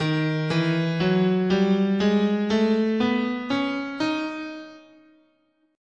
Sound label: D sharp Eight tone Spanish, scale